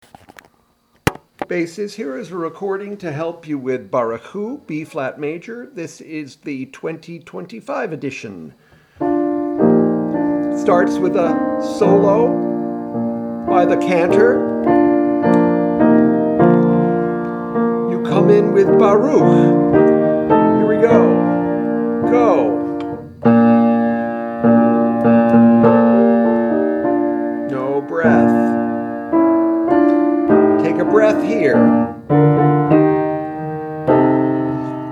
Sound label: B flat major bass; Barchu